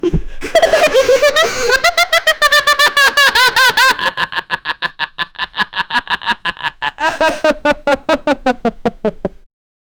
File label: thin comedy laughing